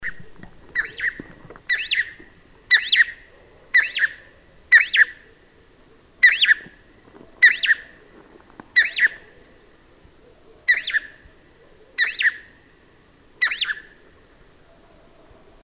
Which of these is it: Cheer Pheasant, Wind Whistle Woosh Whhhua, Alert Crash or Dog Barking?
Cheer Pheasant